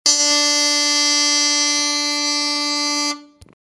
horn

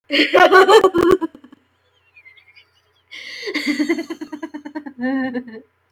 laughing

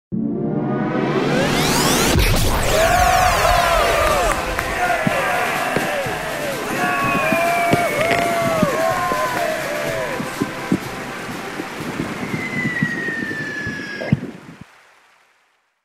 point drop

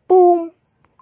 boom

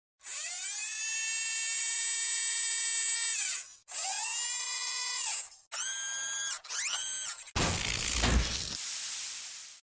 sentry building up

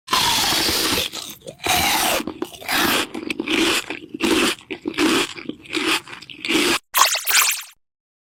munch

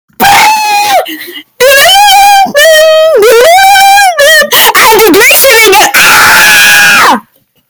ringtone

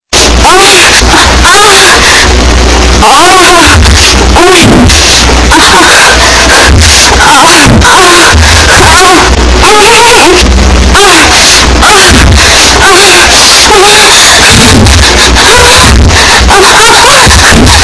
loud

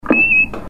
roger beep

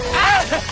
assassin yell

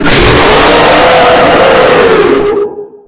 puppet screamer; withered jaiden and fegel

